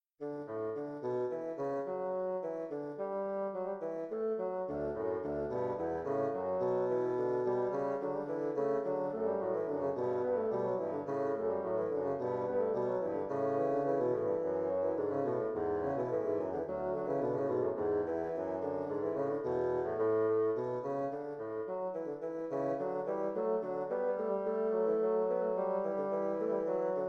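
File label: bassoon fugue in D major; philidor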